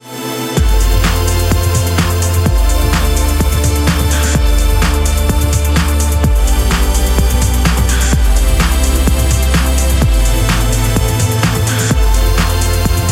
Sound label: Beat String